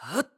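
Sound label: Lift, voice; Link; BOTW; Breath of the Wild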